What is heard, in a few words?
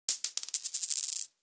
coin, Nokia 9500